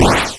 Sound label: launch